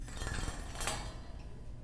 Rhythmic Metal; BB Retail Therapy Sample Pack

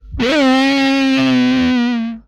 Falling, Vocal; retro arcade

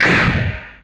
Gun, retro arcade; Guns

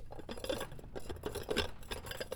Ceramic Bowl; BB Retail Therapy Sample Pack

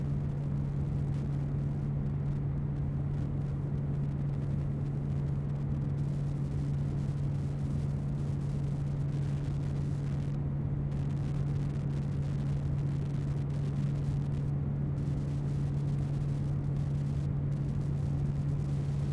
Computer Fan; Fans and Drones

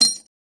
metal falling

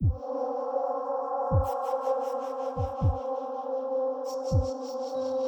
Zombies; Weird

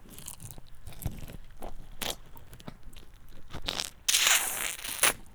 Uncomfortable Gooshy Sounds, melon; Micro Pack; CaptSubtle

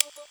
Good Click, Drums; BB 2HTC Samples Vol 4

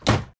wood slam; wood metal SFX

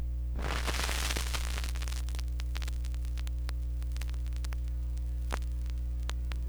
Noise Blaster, record fuzzies; Micro Pack

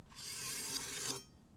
Big Knife Unsheath; Micro Pack

